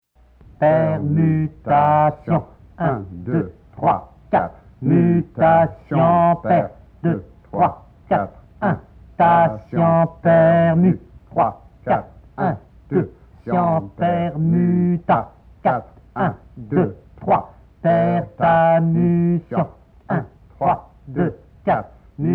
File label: Duet